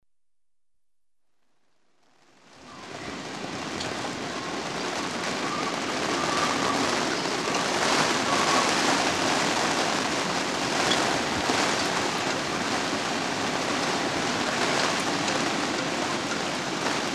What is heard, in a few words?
Gale Force wind and rain on Yacht (recorded in cockpit); Weather; The BBC Sound Effects Library